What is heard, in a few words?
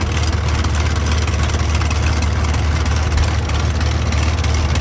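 Plane P40 Interior Taxi Loop; SFX Kit; Airplanes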